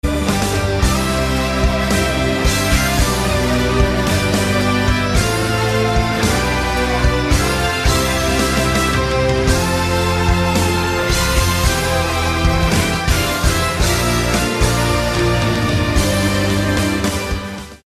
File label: Victory Long